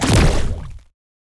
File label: laser; spacemap; npcLasers